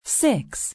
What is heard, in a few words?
Call Six, phone tone; LG B470